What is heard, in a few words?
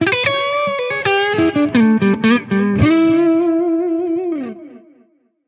Blues, ringtones; Marshall; London KB 1501